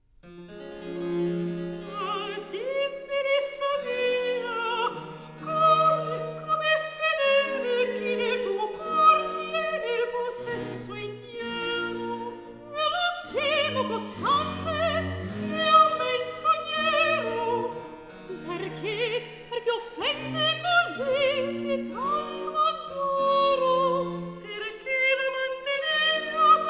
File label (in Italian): Recitativo; Ma dimmi, Lisa mia; Baroque; Alessandro Scarlatti; Duet Cantatas